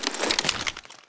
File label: deploy, weapons; csof